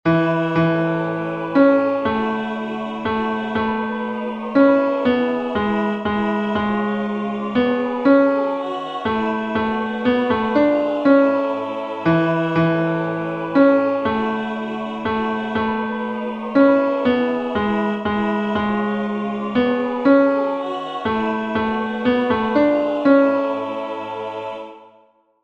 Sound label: We wish you a merry christmas Tenor